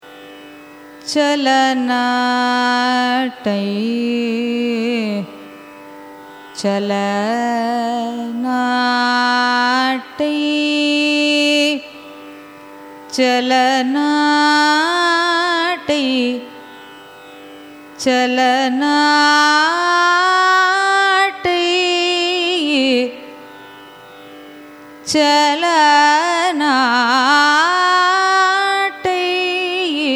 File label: raga chala nattai signature; carnatic music